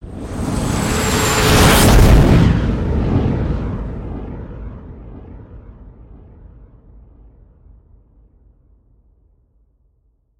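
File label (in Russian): хит-эффект метеорит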